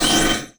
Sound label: Multimedia Internet CD-Rom Flash Blast